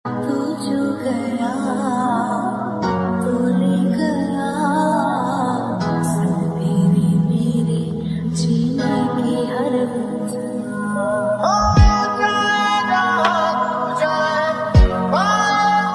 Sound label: Lofi, Hindi Ringtone